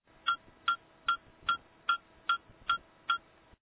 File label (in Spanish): metronomo 150; Educacion musical